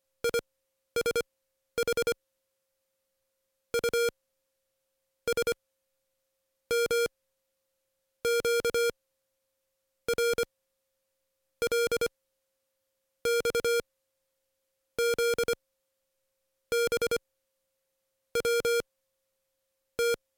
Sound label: pitch; PicoKeyer